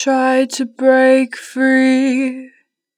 Break free - 2 bar vibrato, Vocals, Natural Vibrato; Harmor Vocals